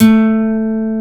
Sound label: JV80 NYL A4, GUITAR; East West - Global Sounds; JV8GUITAR